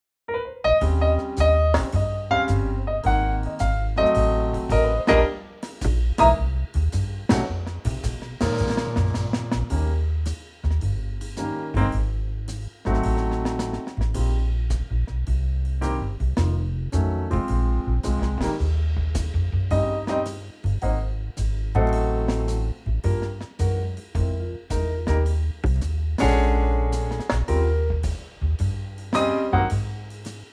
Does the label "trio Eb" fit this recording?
Yes